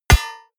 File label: hit metal hammer medium